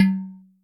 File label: Udu 808, Percussion, Yamaha DTXpress